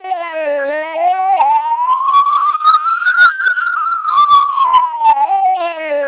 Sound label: siren twelp